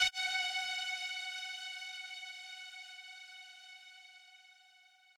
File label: lead